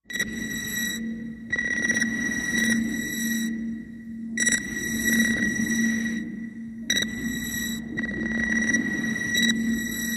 No Reply Machine Space, communications

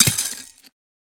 Impact Metal Chink

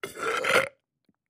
Airy Nasty Belch

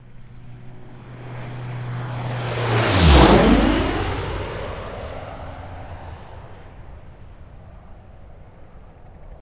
C130 Fst By, C-130 Hercules, Prop Airplane

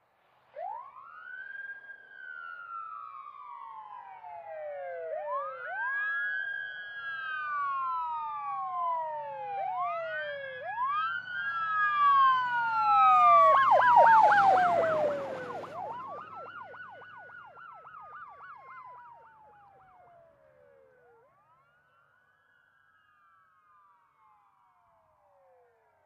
Ambulance By Fast

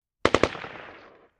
Ak47 Machine Gun Burs

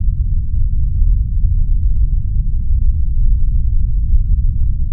Earth Rumble Loop Mon, earthquakes

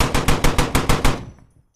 Heavy Machine Gun, bursts

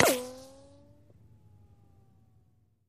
Bullet Ricochet Sin

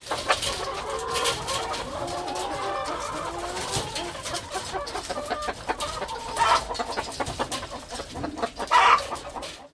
Chicken Coop Ambienc; chickens

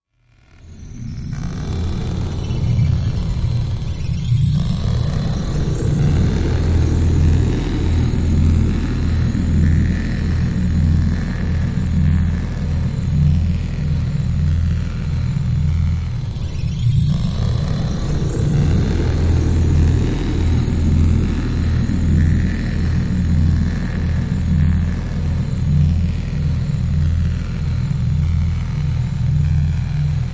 Static Wind Static Tone

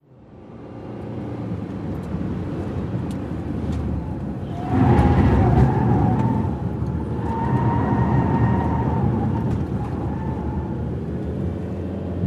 Sm Car Cornering Int, compact

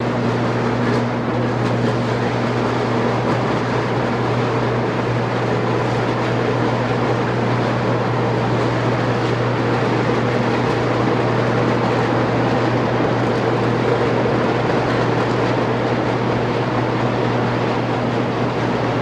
Banging Machines Am, factory

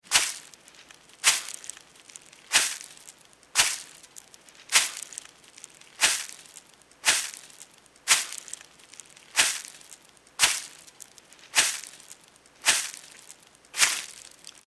Footsteps Grass Slow

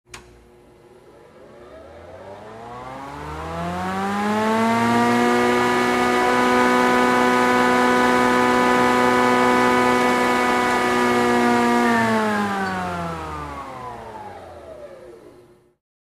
Industrial Saw Large

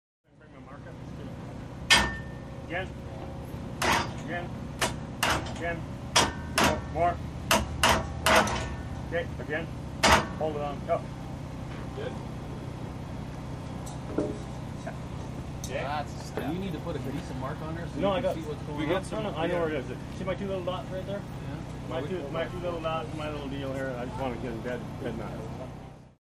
Drag Boat Engine

